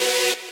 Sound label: saw